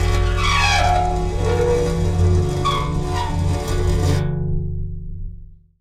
Pane Of Glass Rub

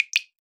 Drip; Drips

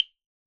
Chinese Temple Block; Percussion Samples

